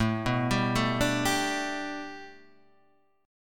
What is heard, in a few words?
B Minor 7th Sharp 5th A, guitar chord